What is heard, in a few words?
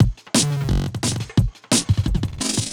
Glitched Beat 175BPM; glitch kit